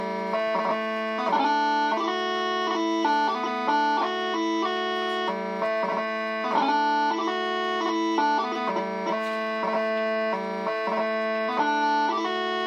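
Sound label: Mairi’s Wedding, bagpipe tunes; weddings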